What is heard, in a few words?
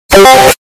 discord ping loud